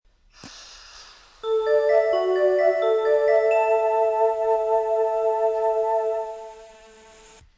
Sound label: sakaisuji melody; osaka